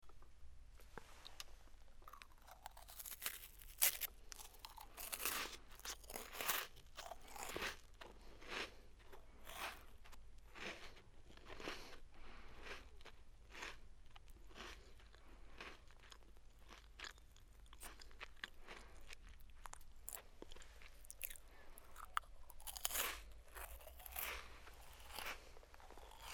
comendo galletas

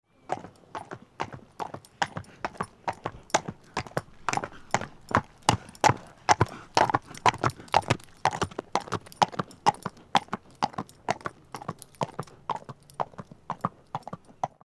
mula o paso

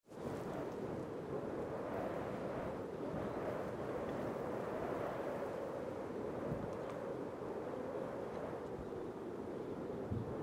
vento

